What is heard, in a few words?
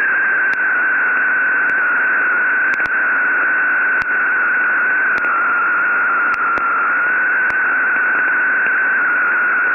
Small LCD TV on 7 MHz